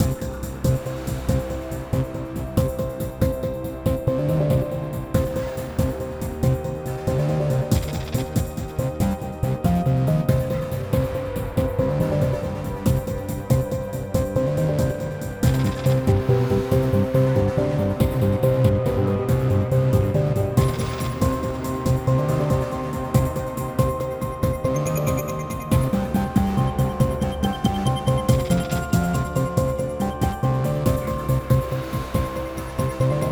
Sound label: Pirate Turn